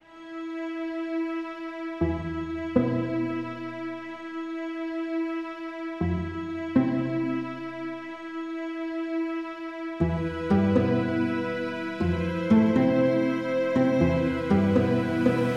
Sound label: Dramatic Scene